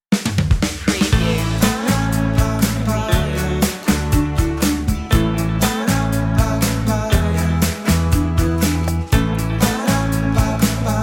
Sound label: feel good